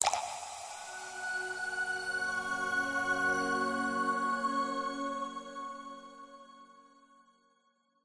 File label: multimedia musical logo branding signature nature water drip into warm pads